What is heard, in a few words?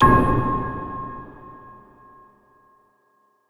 big clank